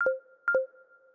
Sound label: Battery warning, Audio User Interface; samsung YP-U5